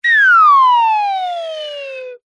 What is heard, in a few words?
cartoon slide whistle double pitch descend